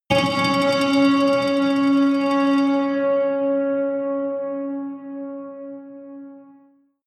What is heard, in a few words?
synth hit rattle high